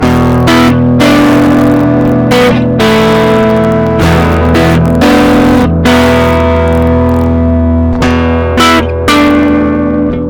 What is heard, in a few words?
GTR RIFF fx8